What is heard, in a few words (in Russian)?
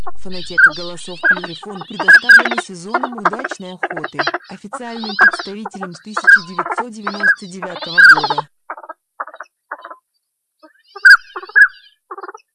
Шилохвость